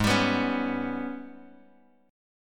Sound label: G sharp Minor Major 11th, Bass Standard; strummed; JGuitar